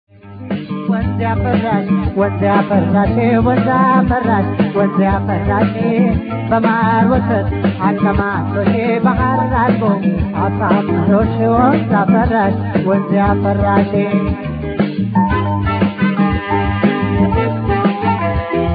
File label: tango